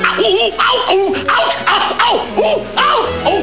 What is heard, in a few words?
hotfoot